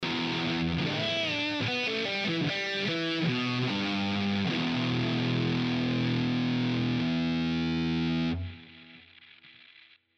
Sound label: Metal